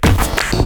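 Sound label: attack punch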